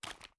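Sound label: foley inspect; weapons; gmod; viper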